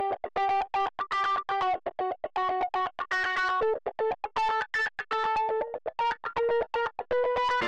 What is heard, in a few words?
wet guitar flanger; souldriven; Morphdrive